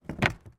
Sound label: industrial road sign construction plastic movement on road